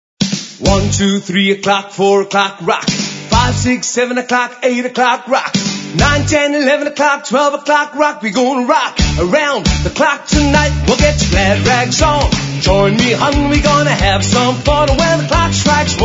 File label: Sixties Medley